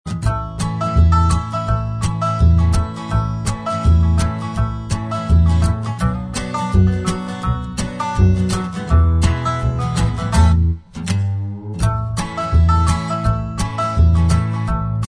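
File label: Instrumental Track, backing tracks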